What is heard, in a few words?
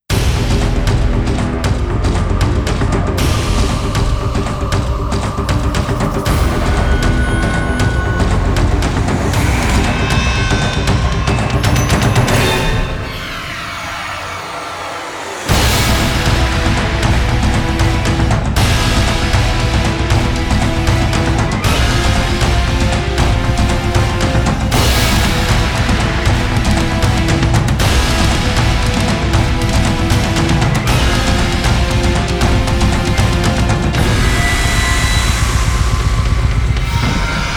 drums